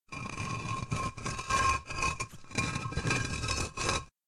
Deep Rumble Walls

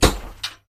Axe Blow On Chainmail; SyrinScape; Against the cult

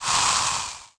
potion FAIL; The Elder Scrolls III - Morrowind; Morrowind GOTY GOG

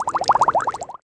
potion, item; The Elder Scrolls III - Morrowind; Morrowind GOTY GOG

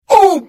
Hit; The Elder Scrolls III - Morrowind

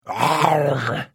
Hit, Vo

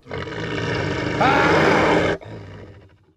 nord attack; The Elder Scrolls III - Morrowind

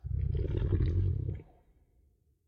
Shadow giant grows and grumbles; SyrinScape SoundsScape Collection; bbh Haunting part 1; TTRPG

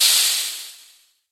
steam burst; SyrinScape; Library Depths

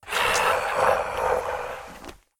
Ghoul Growl; SyrinScape; East Ward

